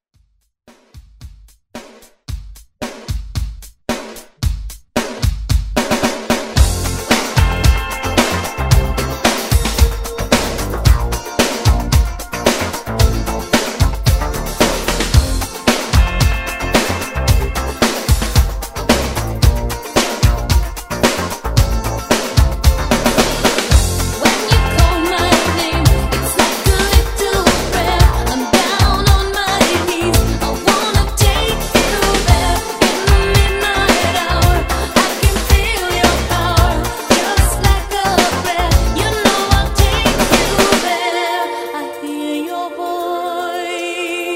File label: 80s Dance Redrum